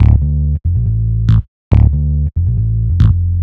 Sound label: Synth Bass; Sonic Foundry (Sony Creative Software); Electrocution